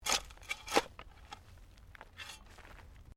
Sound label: Digging Shovel Mulch; Various digging tools and processes; Construction Sound Effects